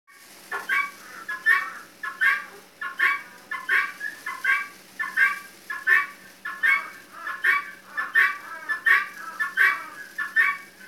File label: Vocal